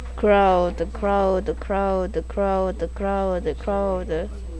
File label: crowd